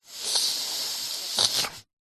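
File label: human male asleep breathing interruption nasal snotty inhale sudden awake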